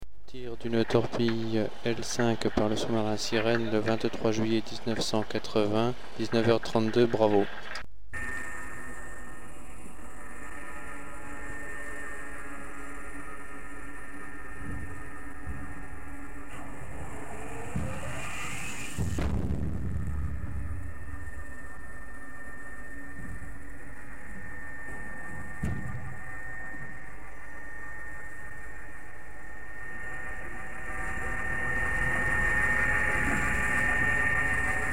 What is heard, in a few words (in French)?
sirene court, sous marin